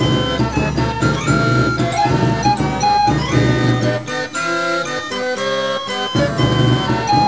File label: march